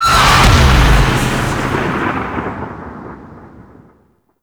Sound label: Cet Invasion Offering Paused Generating; Warframe; Cetus